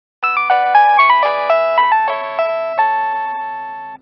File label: nokia polyphonic, ringers